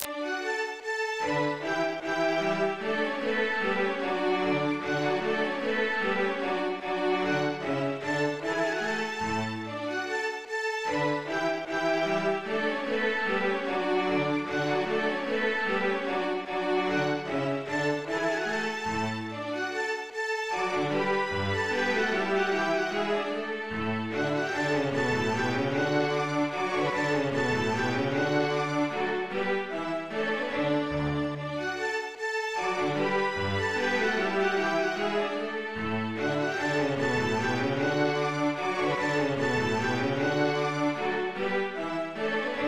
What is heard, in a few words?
Haydn String Quartet in D major; Haydn String Quartet in D major Hob 114